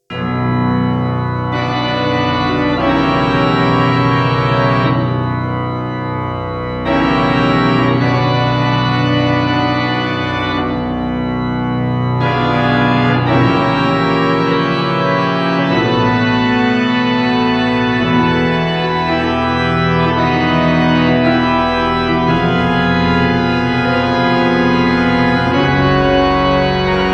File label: Phantom Pipe Organ